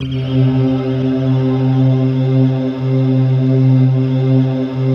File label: C2, RAIN CHOIR; Best Service; Advanced Media Trax Modern Composer